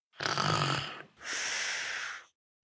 Snore CLassic, Snoring, 1 child, short, classic, human voice, kid; Breathing; VoiceBox